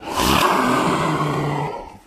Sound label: zombie growl, hunter voice idle